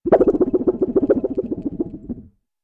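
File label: Rapid Metallic Warble, sequences; Very Rapid, Metallic Warble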